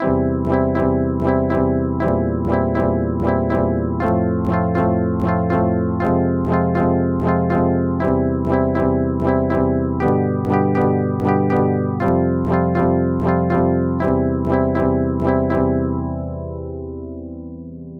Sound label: Guitar Karplus Strong Long; synthesizer-haskore